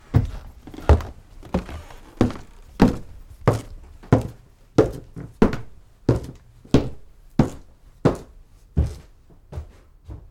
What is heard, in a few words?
Walking Hard Floor With Squeeks